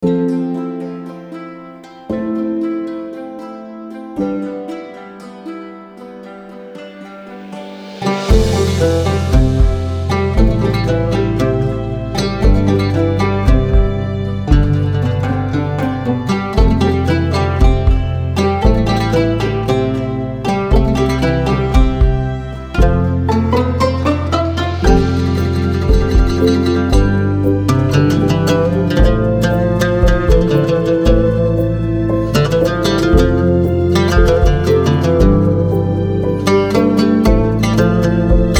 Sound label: RAMADAN VERSION NON PERCUSSION